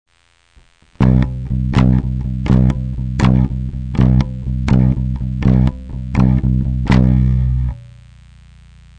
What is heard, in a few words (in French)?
accent, bass; main droite